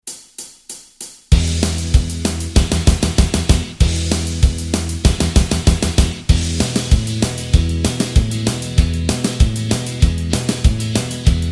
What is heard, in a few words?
(E) no lead guitar